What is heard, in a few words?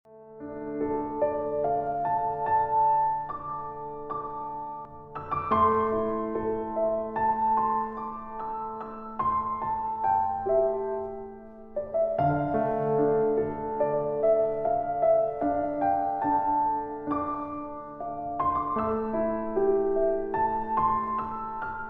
Peaceful Piano